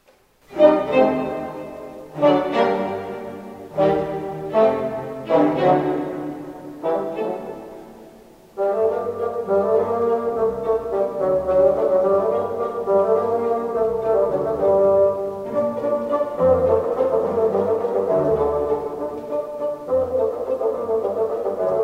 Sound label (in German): Fagottkonzert; Wolf-Ferrari